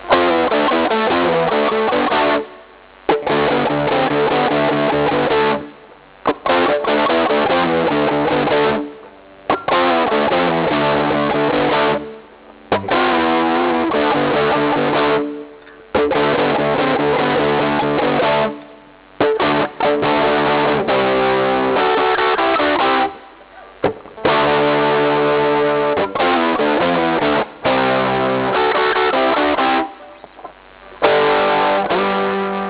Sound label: knocking